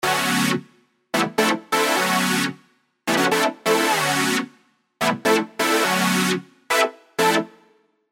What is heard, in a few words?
house stabs, serum 2; 12 Inch Therapy; alonso saloon serum 2 house stabs